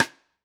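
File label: Pearl Piccolo Side Snare On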